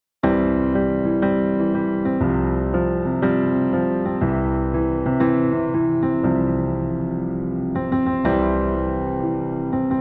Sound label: Marcha Nupcial Wedding March Karaoke Pista